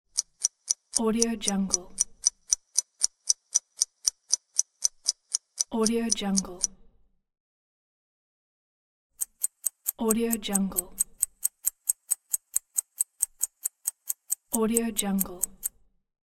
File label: clock ticking